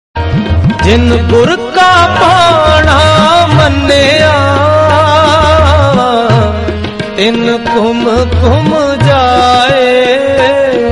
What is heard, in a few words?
gurbani